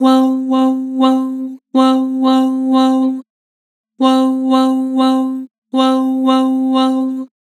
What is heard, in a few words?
"Woah", Harmor Vocals